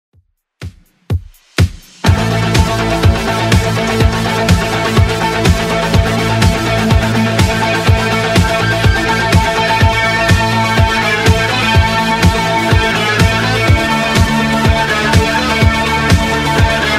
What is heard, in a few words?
80s Rock Redrum 124bpm